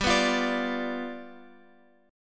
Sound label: G 7th G down Guitar Open F; G7 strummed